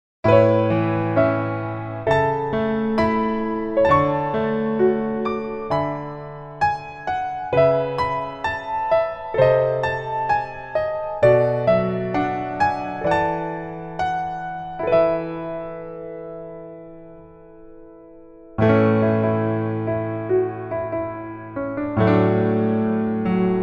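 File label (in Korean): Piano version 멜로디 MR -1키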